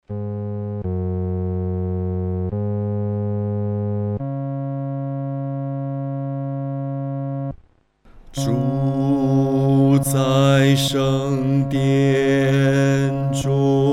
独唱 第四声

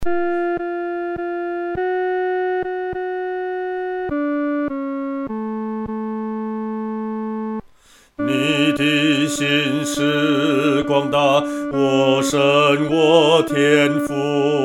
独唱 第二声

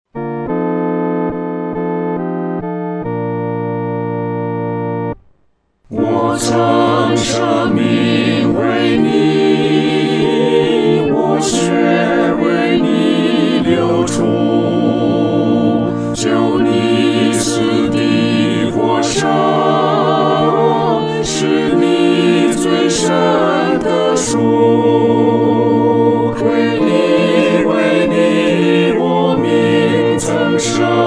合唱 四声部

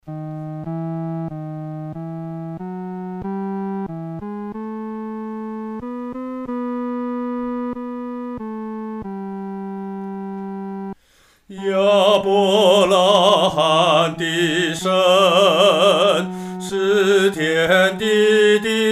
独唱（第三声）; 亚伯拉罕的神

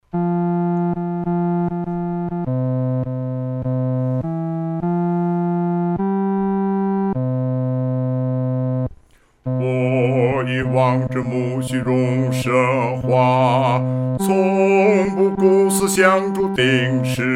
独唱 第四声; 在各各他

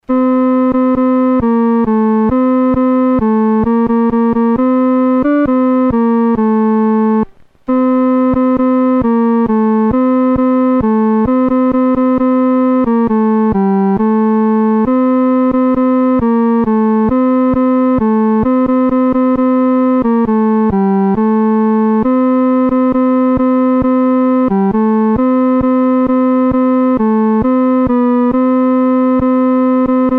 独奏 第三声

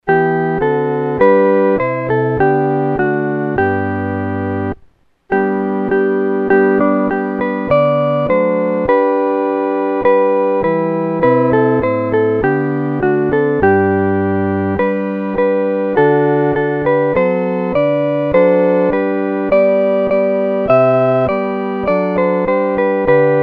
合奏 四声部

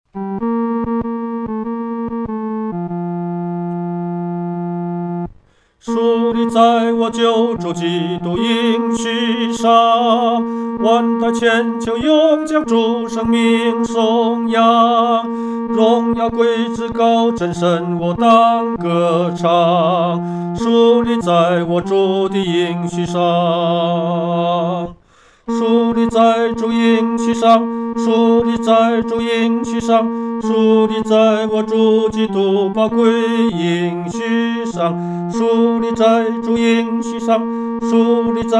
独唱 第三声

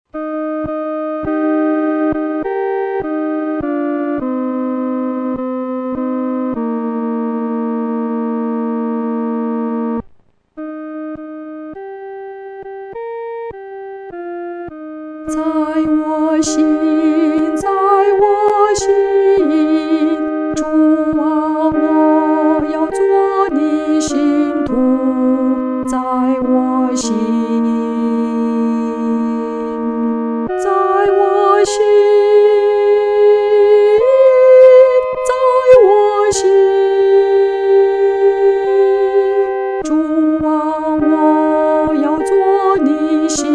独唱 第二声

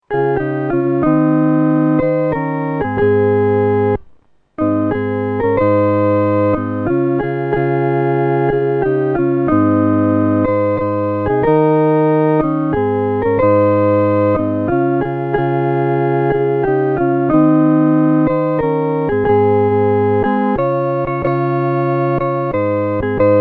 合奏 四声部

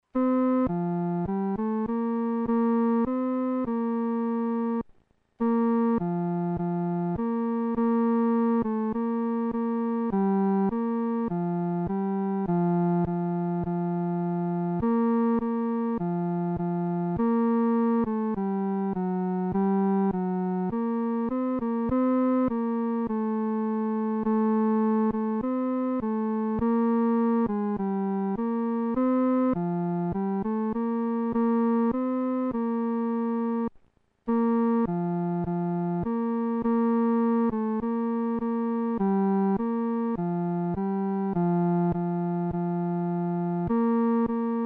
独奏 第三声; Lo ! He comes With Clouds Descending

